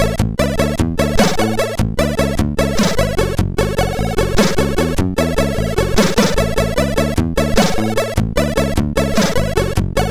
sid MOS6581R4

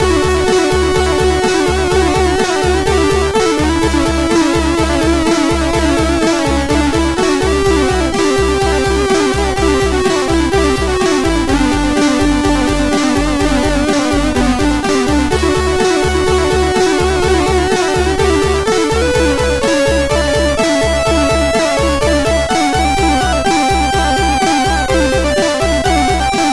MOS6581R3, sid

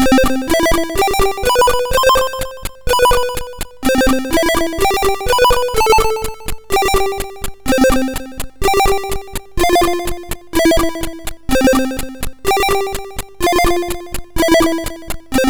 sid MOS6581R3

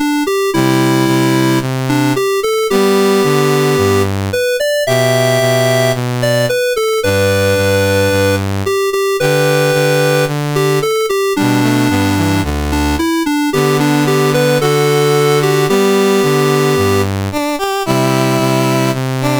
Djibouti, National Anthems, CSG8580R5

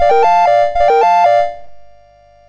sid, MOS6581R2; Donkey Kong 1983

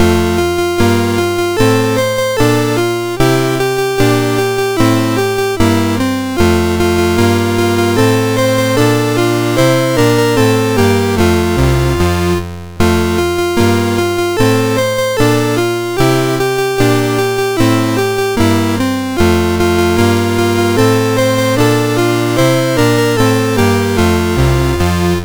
Ten Little Indians, MOS6581R2

